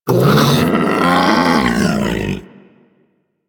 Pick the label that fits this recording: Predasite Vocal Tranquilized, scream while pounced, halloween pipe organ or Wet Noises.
Predasite Vocal Tranquilized